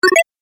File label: Collect Chiptune, video game